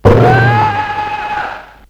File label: bear kick